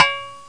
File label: ska